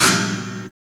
CYBER KIT, DRUMS

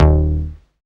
SYN OLD, dance synth; Sound & Vision - Gigapack I CD 2 (Roland)

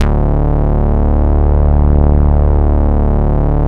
MOOG D2F; 90S MOOG

